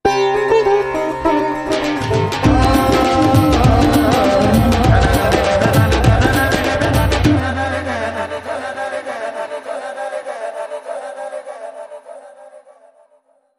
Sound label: Raga, Sting